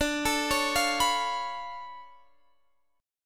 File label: D Minor Major 13th, down, Guitar, Drop D